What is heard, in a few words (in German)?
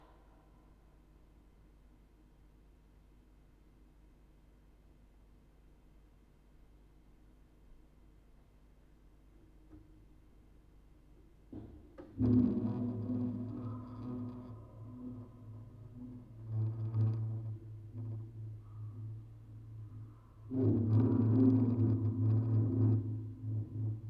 Aufnahme Spieluhren Quartett 4 Kanal stereo